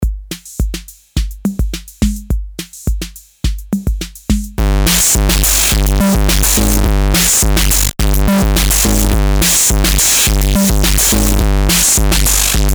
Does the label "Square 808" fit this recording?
Yes